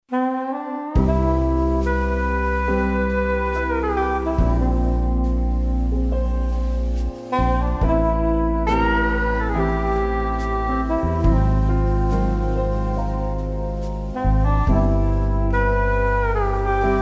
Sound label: Soprano Sax